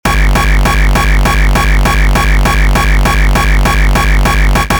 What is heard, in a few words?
Feverish Noise; kickoorator